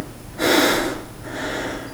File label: male, Realistic Fatigue Voice; oblivion